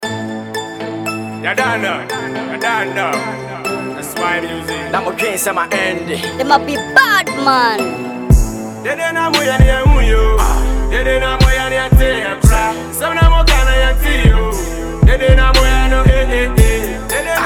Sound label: Diss track